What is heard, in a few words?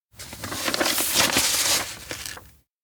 paper swap; anemoi